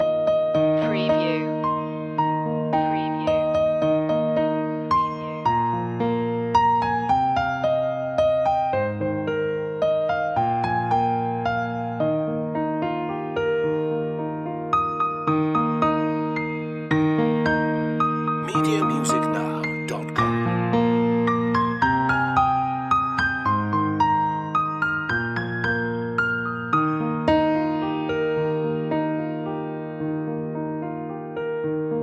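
the holly and the ivy piano loop, Christmas Traditional; media music now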